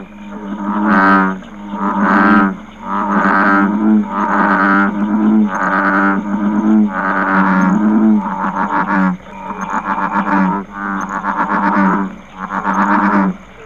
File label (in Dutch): roep